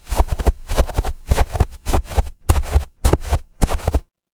footsteps snow

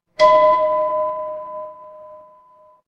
door doorbell

